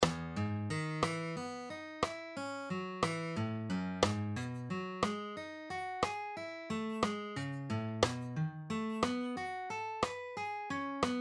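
String Skipping Exercises Half Speed, Arpeggios in key of E minor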